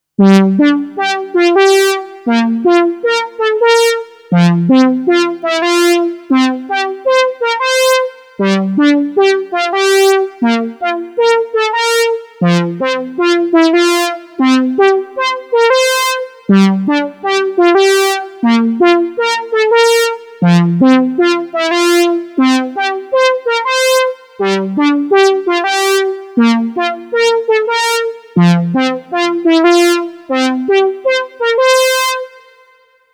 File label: OB brass